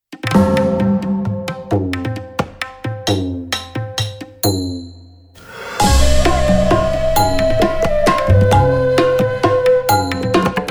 Crossing Hands 66bpm

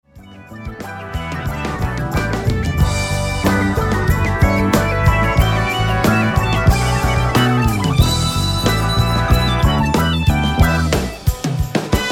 Funky

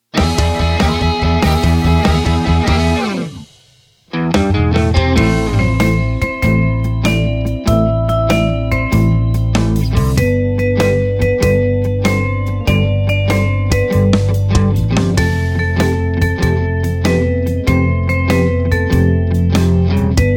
Swing ad lib

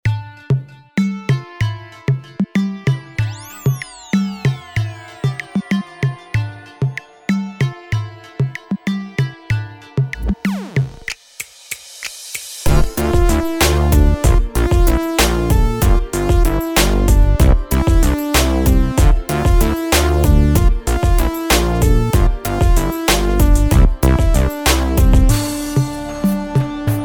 Elektrobeat

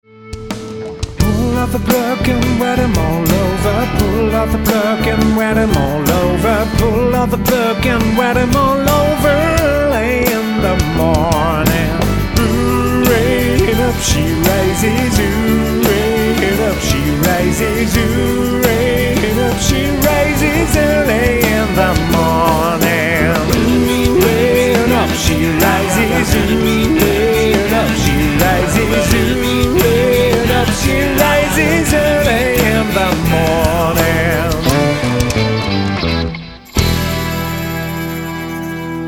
Rock

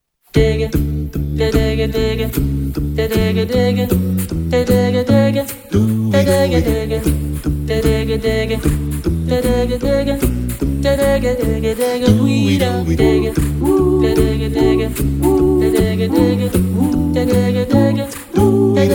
SWINGTIME SONG

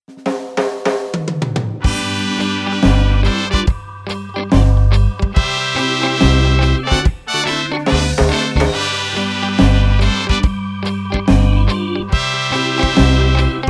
Reggea Song

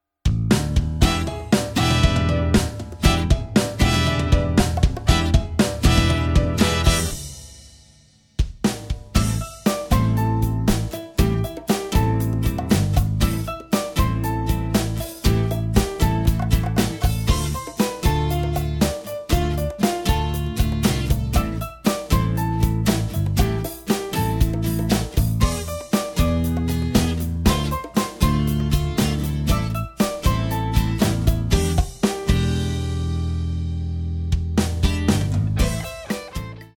Rocky Blues

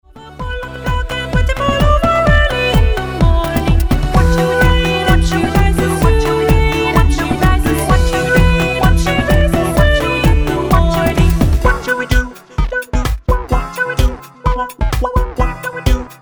Techno

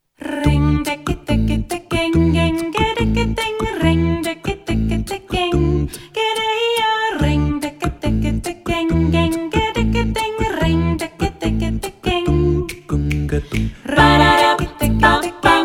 SALSA BEACH CLUB

